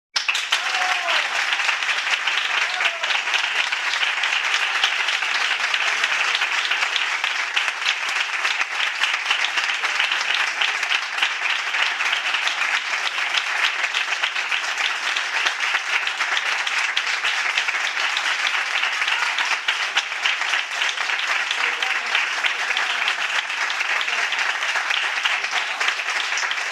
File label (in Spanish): aplausos efecto de sonido